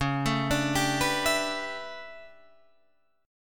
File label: A 11th Db, guitar chord